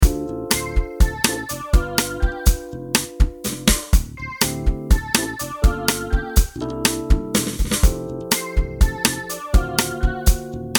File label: Half Diminished